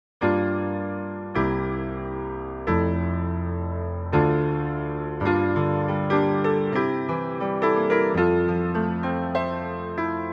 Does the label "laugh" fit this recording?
No